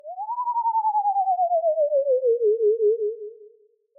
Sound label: tone; Halloween; seasonal